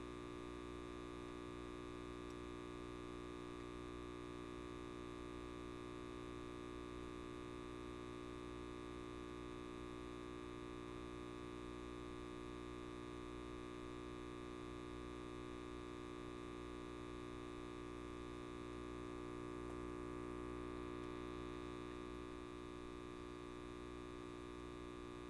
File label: Light Bulb Buzz, Flickering; Haunted Bloodlines; SFX Producer; Lights